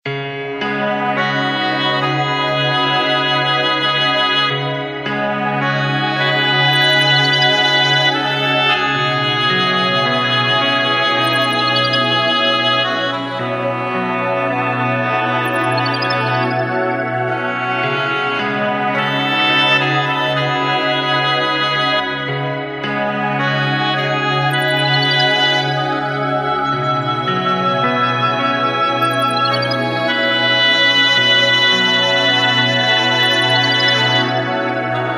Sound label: Nighttime oasis, ringtones; Samsung Galaxy-S3